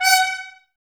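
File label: Brass trumpets F#, Mute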